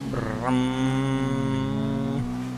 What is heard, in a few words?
brmmm